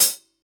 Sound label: focused closed hi hat sample f key; hat samples